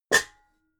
pots hit soft

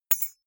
key ring small drop carpet soft

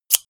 scissors close

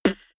beatbox snare punchy damped

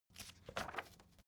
folder page turning soft

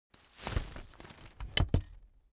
umbrella big open full

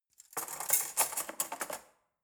cutlery forks drawer place soft

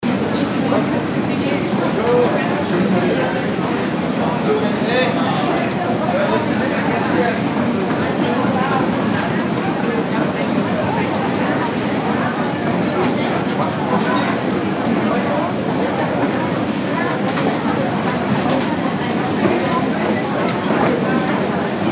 department store atmo crowded

